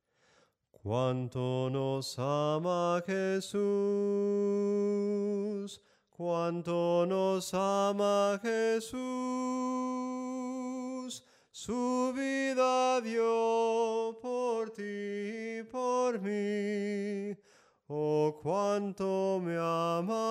SOPRANO CANTADO; CUANTO NOS AMA

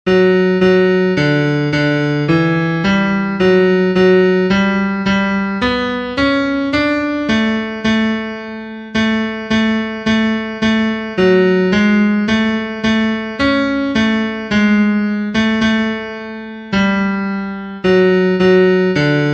TENOR, himnos en piano; cantos del camino

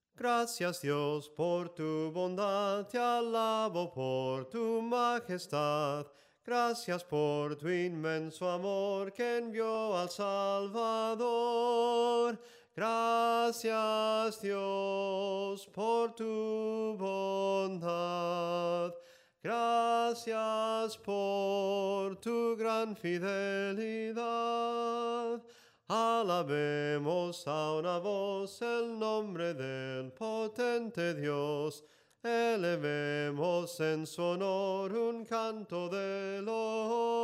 SOPRANO CANTADO, himnos en melodia soprano

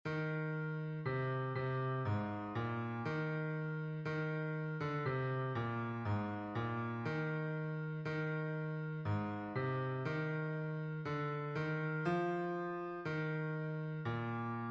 bajo, himnos en piano; 64 GLORIOSO CRISTO; cantos del camino

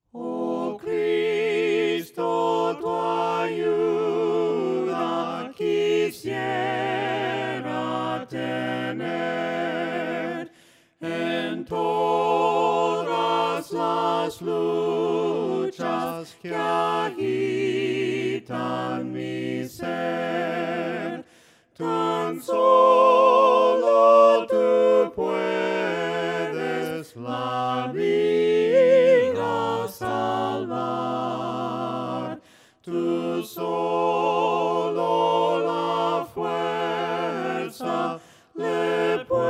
VOCES; ¡OH CRISTO TU AYUDA QUISIERA TENER

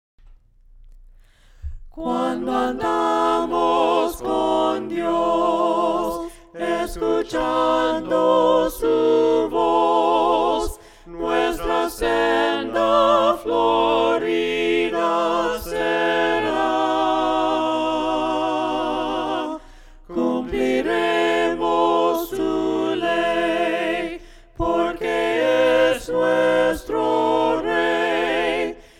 CUANDO ANDAMOS CON DIOS VOCES, himnos a capella; cantos del camino

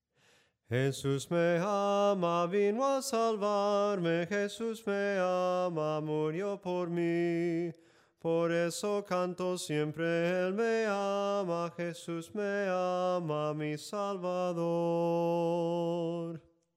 SOPRANO CANTADO; JESUS ME AMA

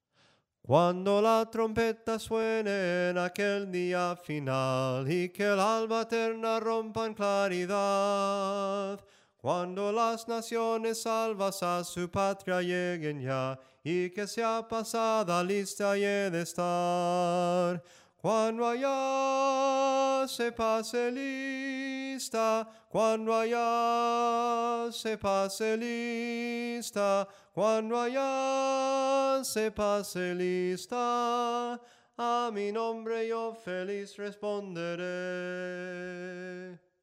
SOPRANO CANTADO, himnos en melodia soprano

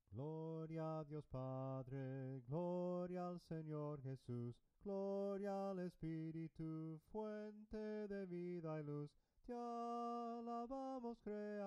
SOPRANO CANTADO, himnos en melodia soprano

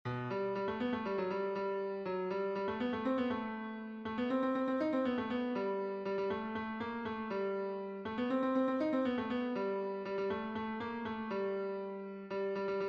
tenor; SIN TI

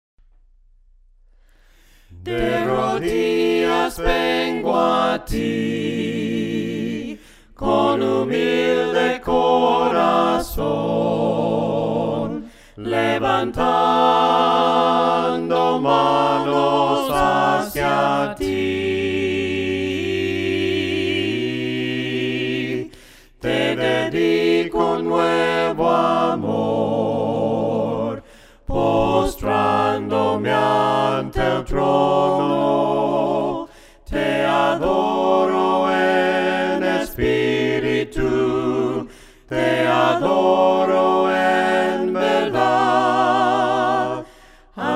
VOCES, himnos a capella; cantos del camino

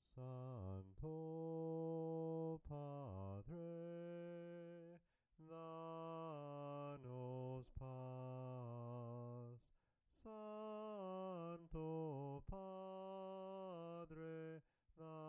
SOPRANO CANTADO; SANTO PADRE

